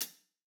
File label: hihat; Reference Beat BPM-130; Pophits